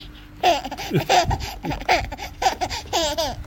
laughing

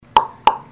knock SMS

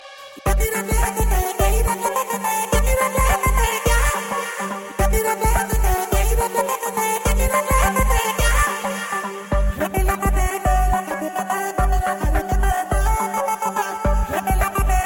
Tech Buzz

